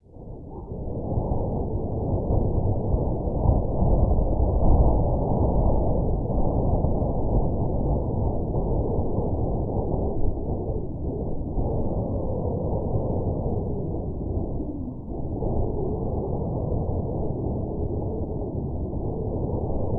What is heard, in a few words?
desert wind; svencoop; zombie nights